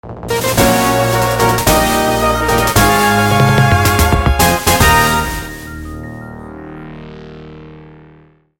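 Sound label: Stage clear Music; ultionus a tale of petty revenge switch gamerip 2022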